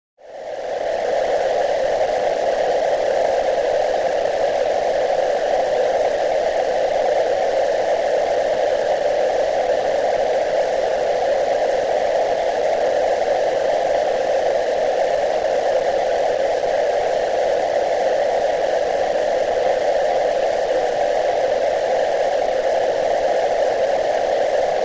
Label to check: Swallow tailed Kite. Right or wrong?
Wrong